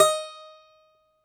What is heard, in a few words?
DOMRA D, Prima Domra, Plucked Strings